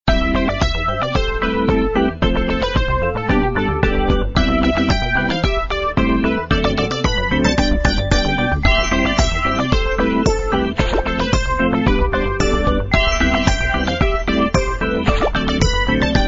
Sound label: music win; magic heaven